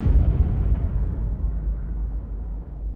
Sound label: deep cinematic boom and rumbling echo; explosions